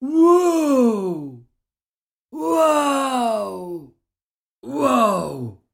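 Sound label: teenager's "wow" sound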